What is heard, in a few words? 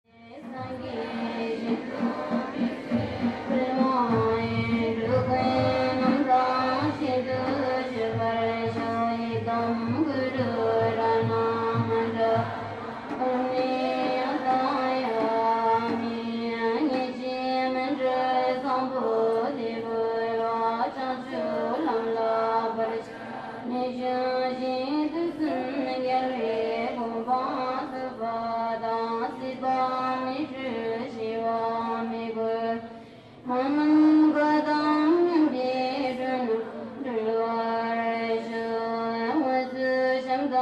puja; Leh; dolma